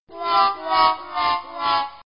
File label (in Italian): Loser, tono di notifica suono perdente